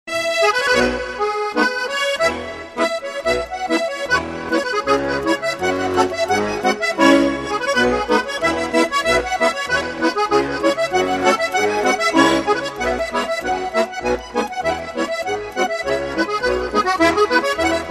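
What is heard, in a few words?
Tatarskie melodii Tatarskaya garmon, Tatar melodies, Tatar accordion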